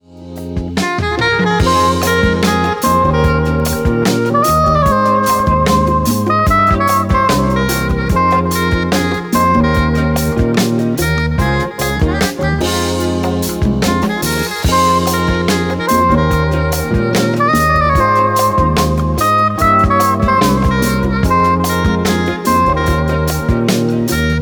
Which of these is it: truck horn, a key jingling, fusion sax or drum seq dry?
fusion sax